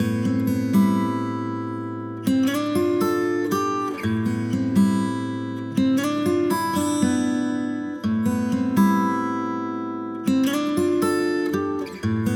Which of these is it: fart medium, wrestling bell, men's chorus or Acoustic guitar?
Acoustic guitar